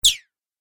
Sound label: Multimedia Swipe, transition